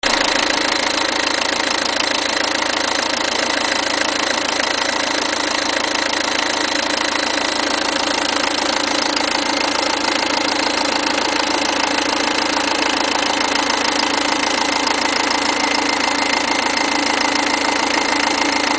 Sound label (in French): bruit pompe injection; On entend un espèce de grincement continu qui semble provenir de la pompe injection